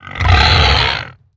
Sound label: hoglin retreat, 疣猪兽：退缩; 疣猪兽（我的世界）; 疣猪兽是一种生成在绯红森林的攻击型生物。; 逃离诡异菌、下界传送门方块、诡异菌盆栽或重生锚